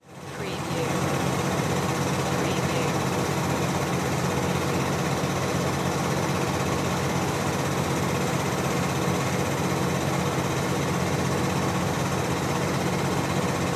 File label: Machine Power Generator